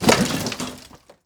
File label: wood debris crash